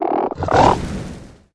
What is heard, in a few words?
crag toad attack